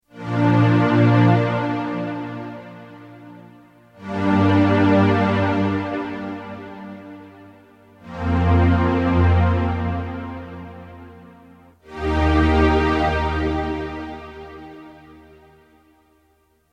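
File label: Aerial Strings; Aerial Strgs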